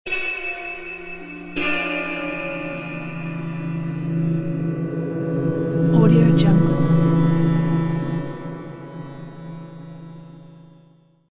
horror transition

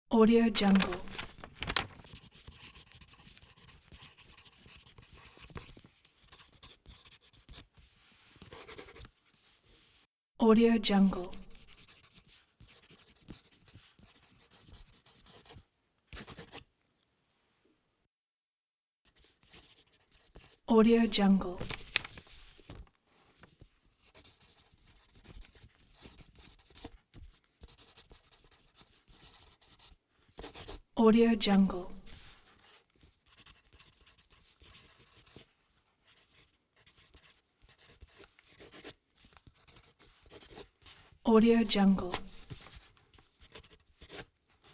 writing with pen

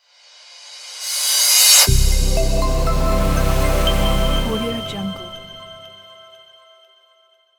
the logo intro reveal

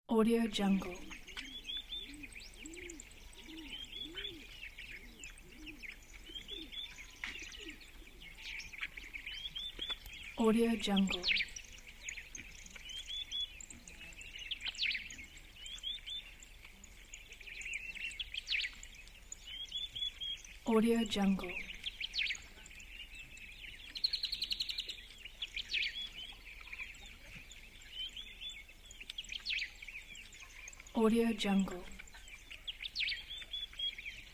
birds in the morning

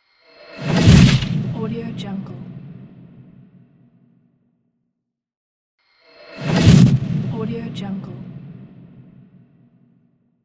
slow motion whoosh

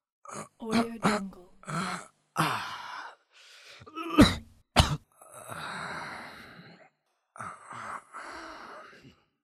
human dying

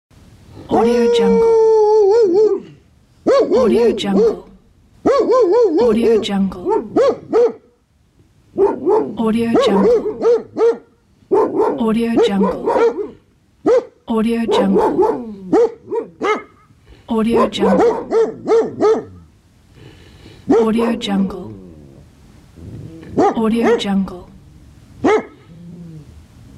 dogs bark

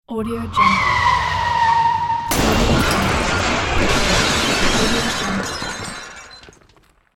car crash

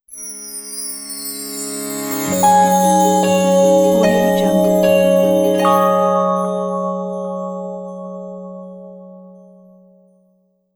dreamy silent logo

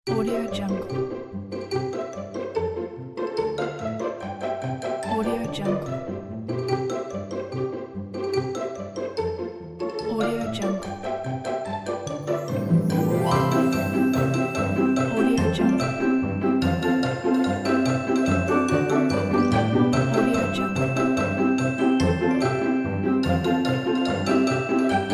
jingle bells orchestral

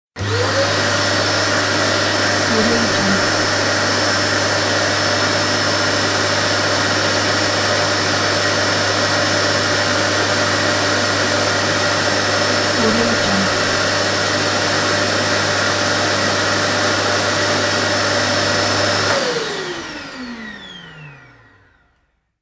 vacuum cleaner; soundfood

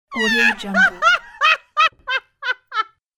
female loud joke laughter

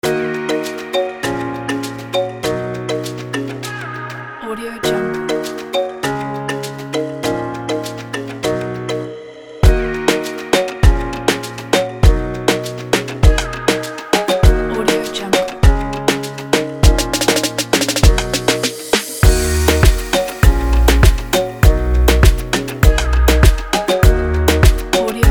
reggaeton kit

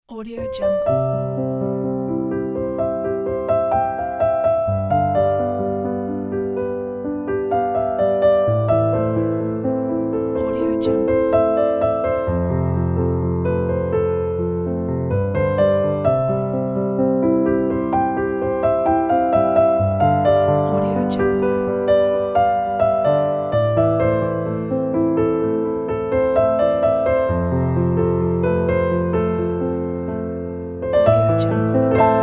the inspiring piano

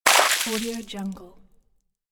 water splash

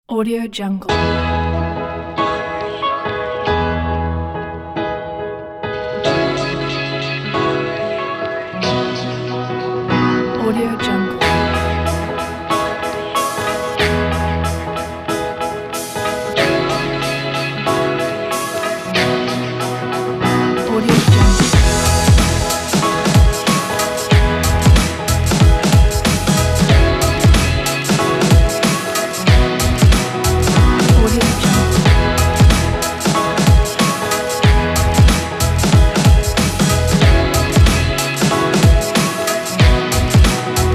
hiphop

